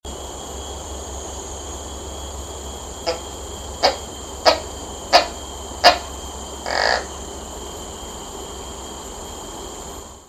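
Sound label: large forest gecko, Gekko smithi vocalisation; Gekko hulk; Johor, Peninsular Malaysia, 29 Nov 2016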